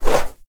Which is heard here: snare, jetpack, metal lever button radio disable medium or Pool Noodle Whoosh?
Pool Noodle Whoosh